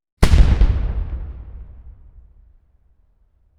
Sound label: Cannon; Telarc Sampler, Vol. 5; Cannon Center 1